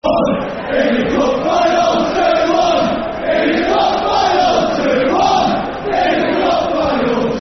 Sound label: Football Chant; Bury; fanchants-com